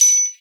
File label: traingle; Percs